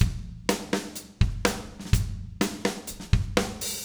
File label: 125 60S Rock Drums Verse; Pop Rock; Fast Tempo; Digidesign; Pro Tools; Pro Tools Essential Loops